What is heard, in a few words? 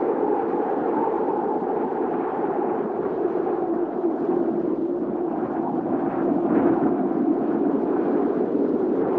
loop wind